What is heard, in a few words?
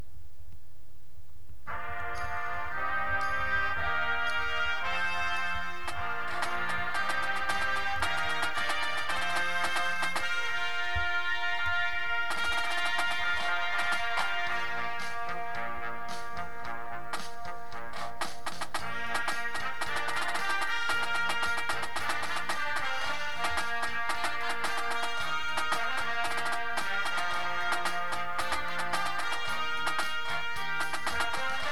snare with music, drumline